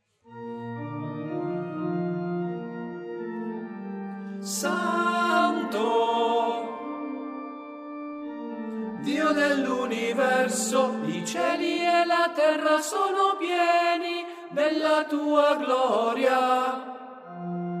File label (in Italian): tenori